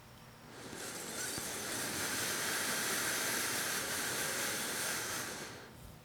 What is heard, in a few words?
Long inhale